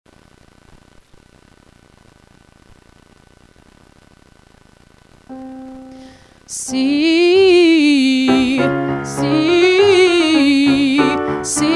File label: Warmup, Vocalization